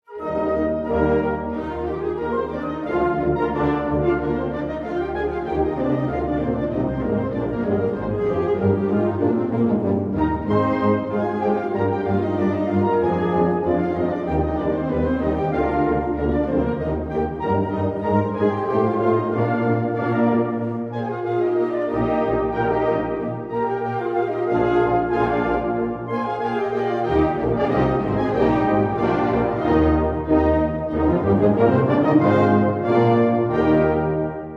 Concerto in E Flat Major, Allegro

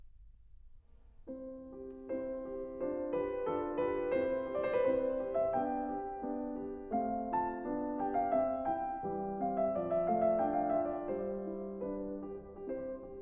Rondo in C Major, Piano; Beethoven Works For Piano

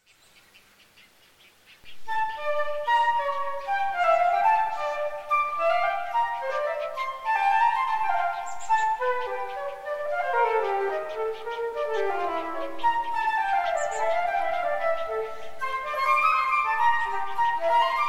Suite in D Minor, Gigue; Suite No. 2; BWV 1008

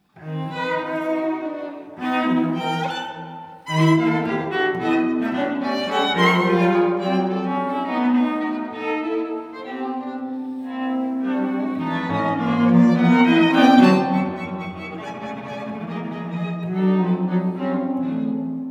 String Quartet Whimsical

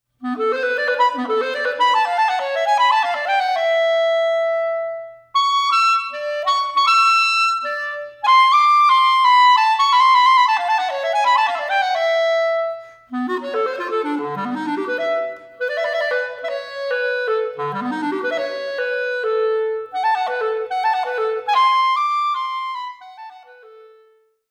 Solo Clarinet eighth note 168

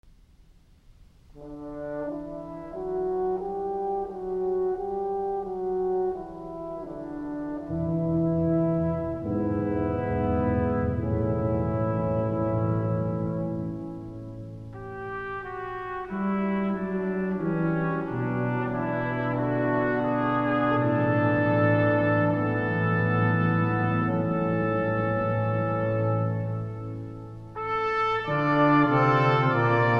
Preludes, in D Major, Brass; V. No. 16

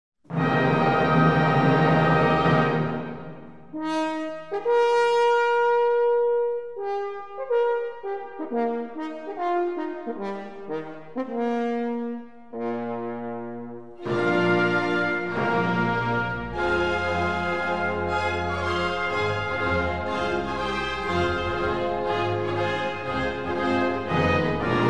Horn Concerto, Allegro; Strauss Concertos

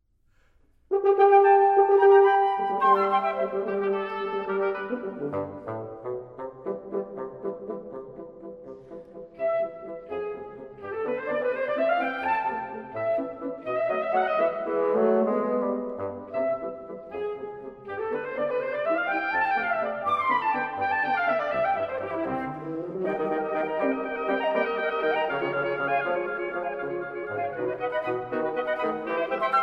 Dance Suite for Woodwind Quintet, Waltz

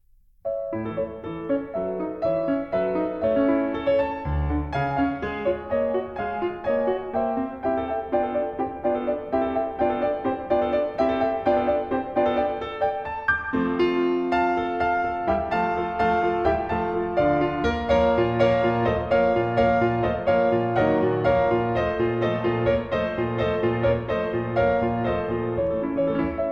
Improvisation For Piano; Martinů

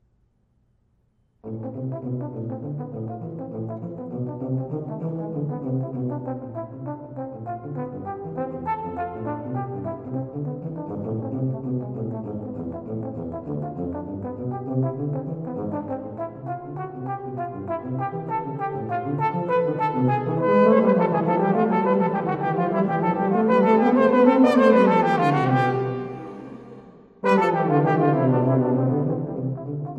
Suite for Tuba and Horn - Gallop

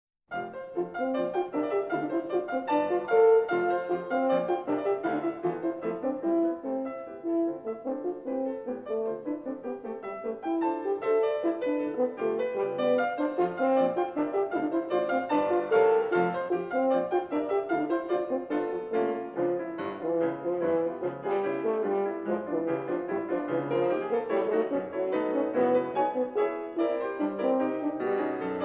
Canon in Octave

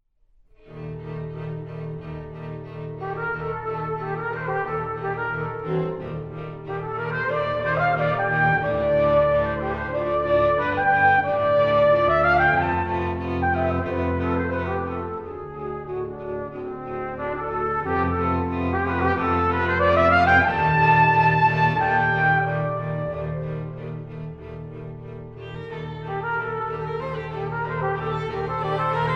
Quintet for Trumpet and Strings, Allegro Moderato

